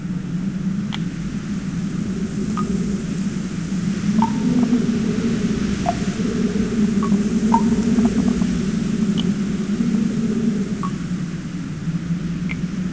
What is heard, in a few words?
Amb Cave; Bruce Lee Quest Of The Dragon; XBOX